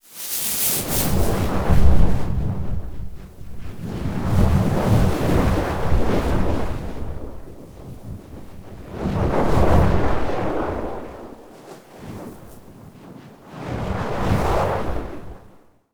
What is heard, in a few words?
Designed Fire - Winds - Binaural, swoosh, waves; Gregor Quendel